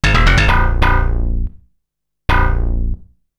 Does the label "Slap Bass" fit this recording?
Yes